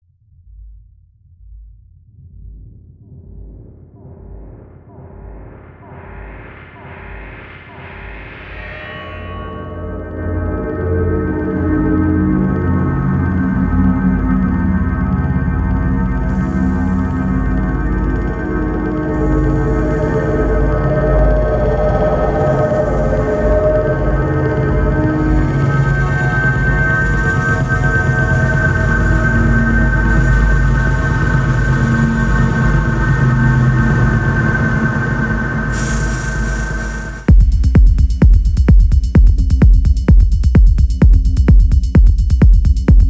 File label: techno